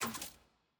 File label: record next click; caitlyn